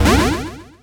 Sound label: escape